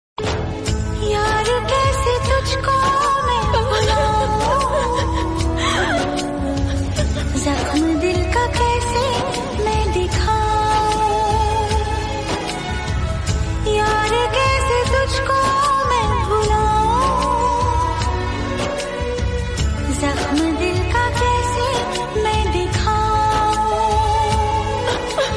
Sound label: sad hindi